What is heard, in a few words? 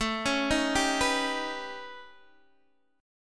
A 9th Flat 5th, Guitar Open D; x,12,11,9,10,9